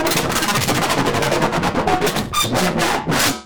Machine Happy Machine

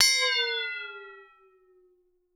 WATER D, BELLS CHIMES; Voice Crystal - Steve Reid Definitive Percussion

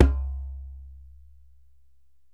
DJEMBE

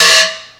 China Choke; KJ Sawka; Drum n Bass & Beyond; Drum Tool Box

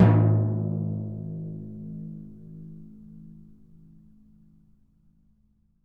TMP A#, Synclavier Percussion; ILIO; Synclavier Percussion 2; World & Orchestral

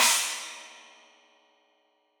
China, Crash, China Cymbal; Deeply Sampled Drums; Cymbals Stereo

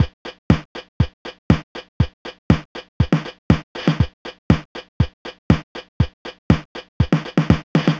Apple II Disco Beat, Drums, Chiptune; Sonic Foundry (Sony Creative Software)

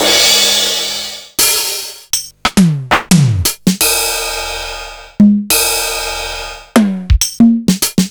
linndrum, Sliced; m8

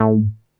NASTY B, BASSES; Masterbits - Vintage Voltage; VV BASSES

